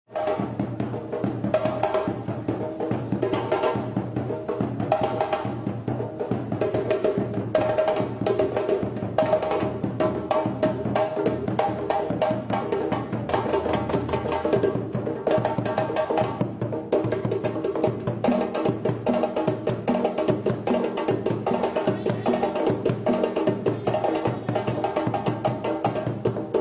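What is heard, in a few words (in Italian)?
bongos, coppia di tamburi africani; Vengono suonati percuotendo le membrane tese con i polpastrelli, l'interno della mano o il polso, producendo in tal modo suoni timbricamente vari.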